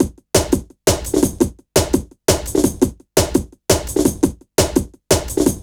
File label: Some Drum, Drum & Bass; Fluid Dynamics